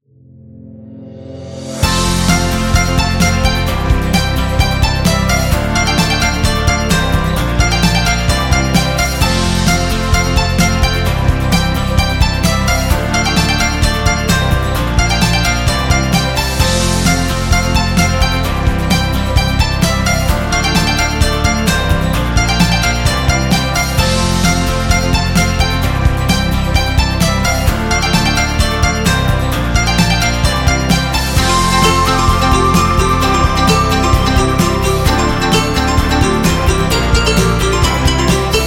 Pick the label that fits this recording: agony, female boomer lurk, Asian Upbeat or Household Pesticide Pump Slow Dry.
Asian Upbeat